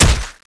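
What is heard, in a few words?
crowbar hit body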